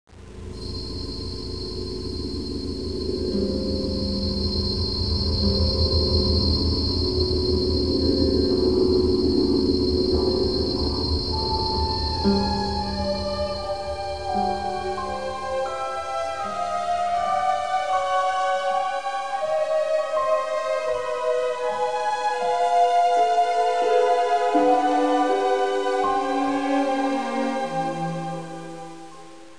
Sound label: spooky; Into That Dark Night; YadVashem; music